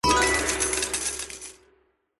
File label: Symbol Regular Win; slots; Ninpo Slash